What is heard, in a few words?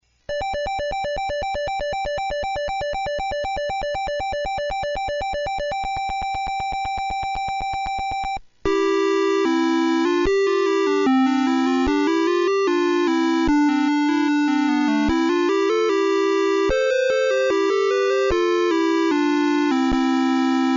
END Win; othello